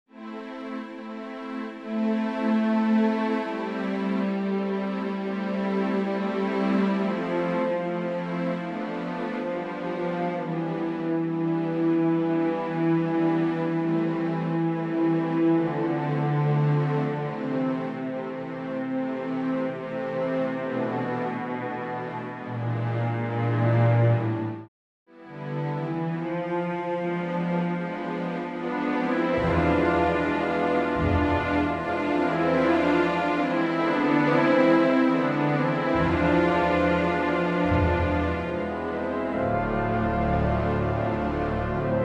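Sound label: Vocalise Am, Full Orchestra Accompaniment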